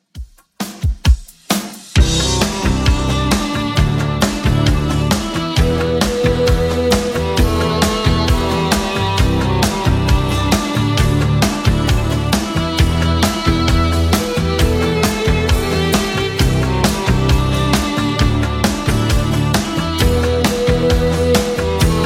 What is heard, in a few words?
80s Xtend, 133 bpm